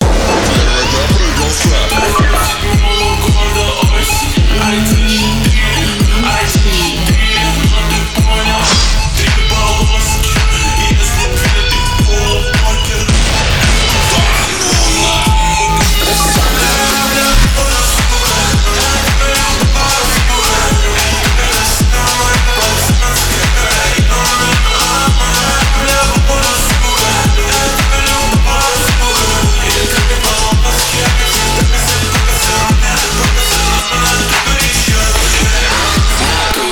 slowed reverb; adidas

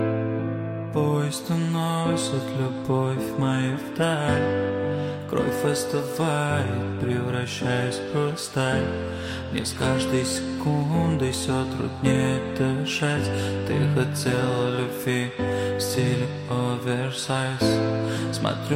Acoustic

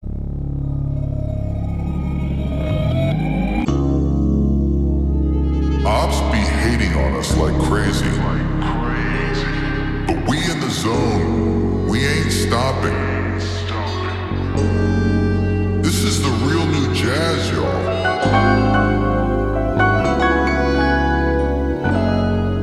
Jazz Interlude

